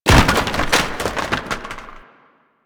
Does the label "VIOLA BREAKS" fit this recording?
No